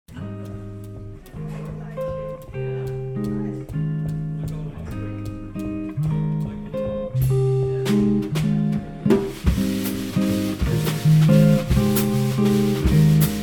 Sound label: raindrops; 8-piece band = trumpet, sax, trombone, guitar, piano, bass, drums, vocalist